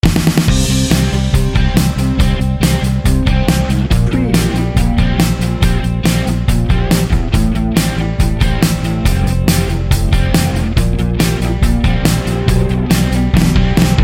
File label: biker ride bed